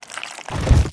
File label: Dung Defender Roll And Throw